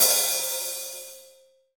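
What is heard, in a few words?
HH, hi-hat; 90 s Sample CDs; Sound & Vision - Gigapack I CD 1 (Roland); Real-Kit m15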